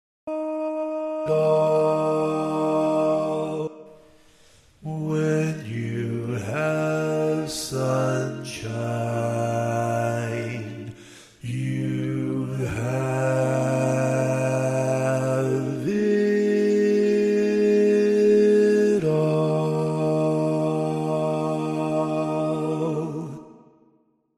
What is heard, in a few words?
Bass